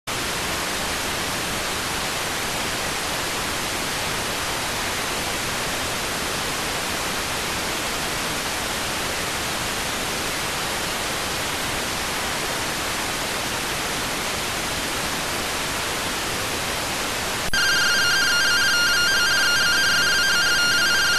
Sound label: rtty rx